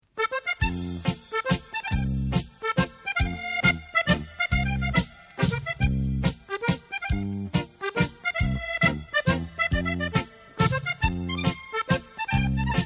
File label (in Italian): MAZURKA, Fisarmonica